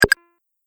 Pickup App Game; Video Game Sound Effects

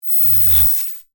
Electric Discharge, Magnetic; Tech; BlastWave

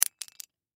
Carabineer Drop; Foley; Drops; Objects; Blastwave

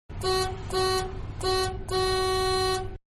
Van-Horn-Windows Closed-Interior; Cars (Sound Effects:Vehicles); Manhattan Production Music

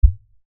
LFE Hit, Lfe Hit Low Bass Impact; Blastwave

